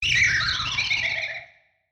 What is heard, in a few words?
ghost